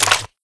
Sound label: m4a1 clip out; csof